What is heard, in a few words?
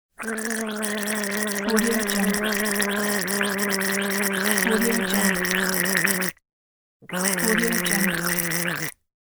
cartoon gargling